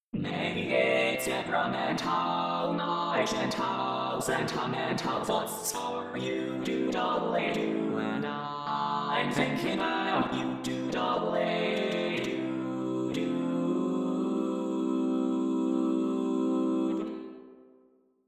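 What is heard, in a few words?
Baritone, barbershop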